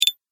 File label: Menu Select Double, ping; multimedia